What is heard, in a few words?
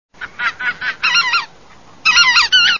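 lesser white-fronted goose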